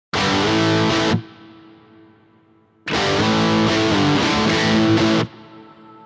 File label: Guitar Msg